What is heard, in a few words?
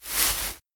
Leaf